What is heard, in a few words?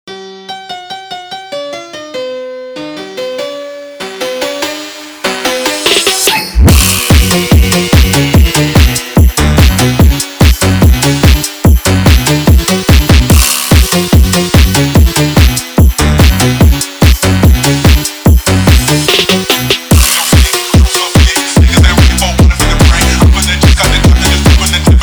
Classical Phonk sped Up